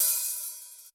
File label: Open HH, Yamaha RY20